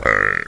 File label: burp